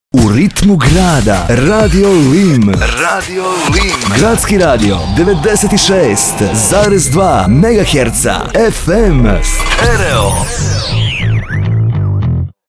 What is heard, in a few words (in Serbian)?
RADIO DZINGL Gradski radio U ritmu grada; RADIO LIM